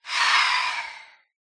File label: idle, phantom; mob; Minecraft